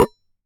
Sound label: Damped Hit, Glass, Large Wine Glass